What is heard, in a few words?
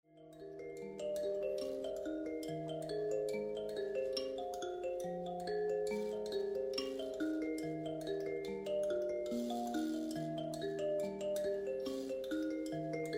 sample, mbira